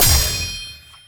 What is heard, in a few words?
sword